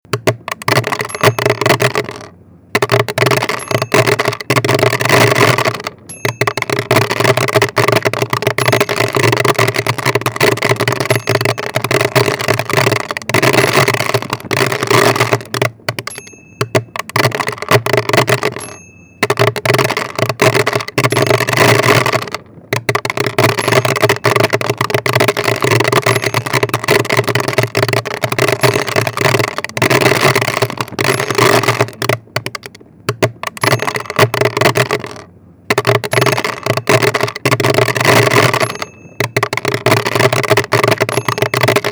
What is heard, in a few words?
hailstorm